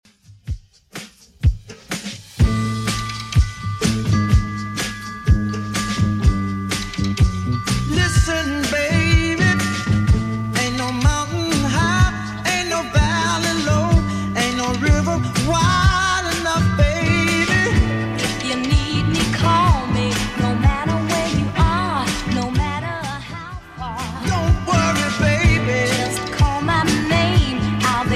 60s Redrum, 125 bpm

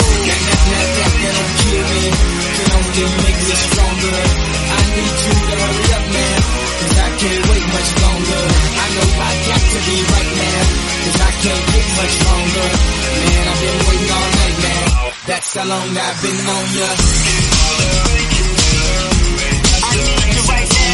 REDRUM Snippet 113bpm Fm

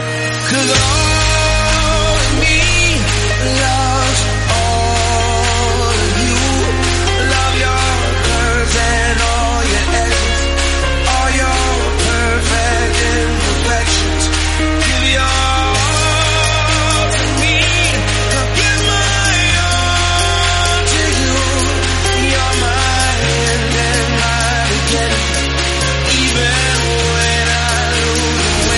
128 bpm Fm; remixplanet

